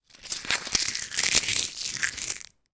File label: foley leaves dry scrunch in hand